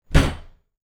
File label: door close